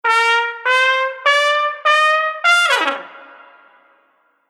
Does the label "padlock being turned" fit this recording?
No